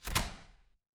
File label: door open